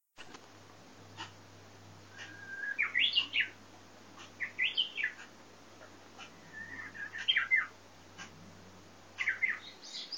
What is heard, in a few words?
hokekyo